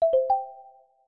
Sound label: meet, notifications; OnePlus 5T Stock Ringtones